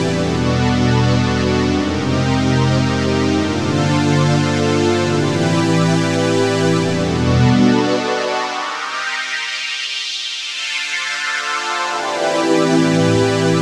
Pad 141 BPM B, Club Techno; Zero-G; Groove Loops

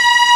Mystic Chanting loop; SNES; Chrono Trigger

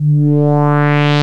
MINIMOOG; Rubber Chicken Software Sample Bank; OLD SYNTHS

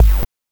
BANK1 BD, NES DPCM; MIDINES

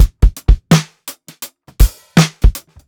SWUNG 83 BEAT, DRUMS LOOPS; BASEMENT DRUMS VOL 2

